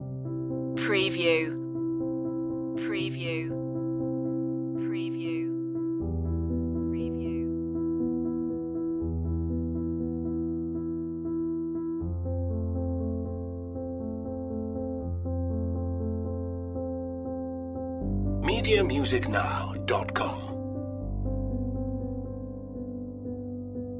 piano loop

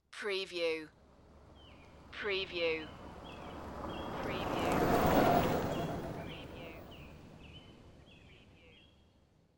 Golf Cart Pass By

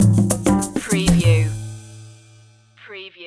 jazzy opener stinger

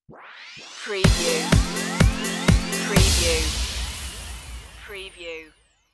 liquid gold stinger

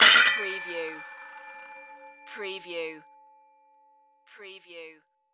Circular Saw Blade

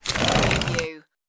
sliding window

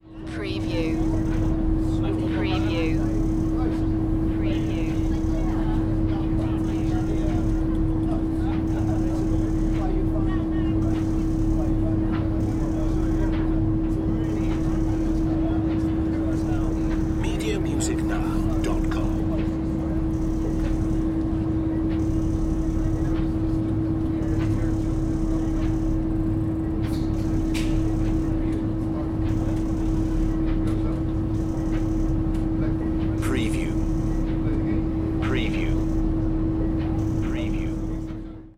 Ferry On Deck

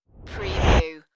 Rush

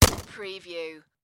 Machine Gun HK416

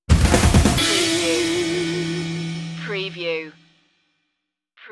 dorm room stinger

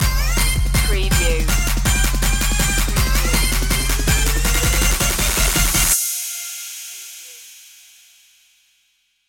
trap this stinger

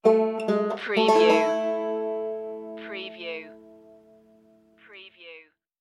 red fern grows stinger

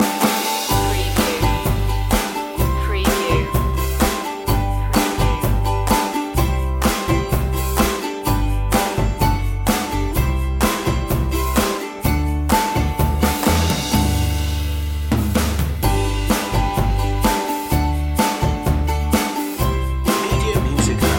instrumental

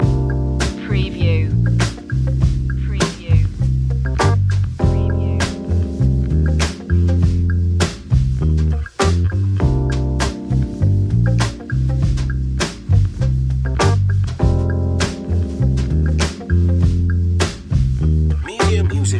soulful loop

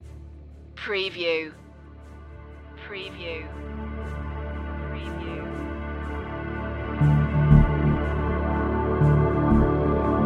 interference stinger

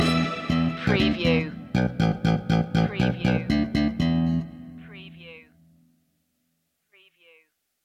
fast road loop end; media music now

